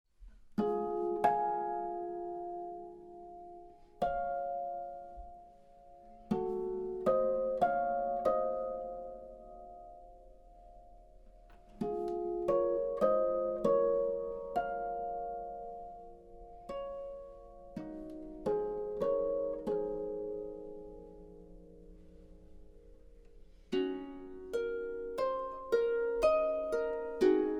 harp intro

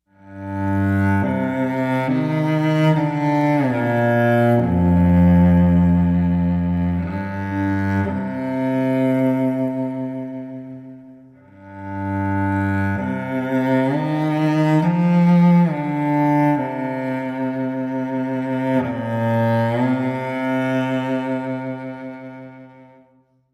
cello intro

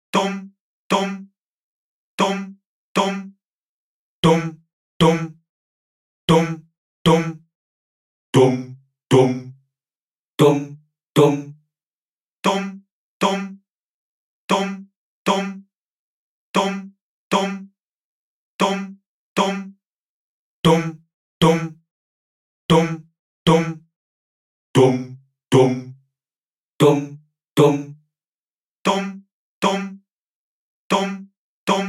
Bass line

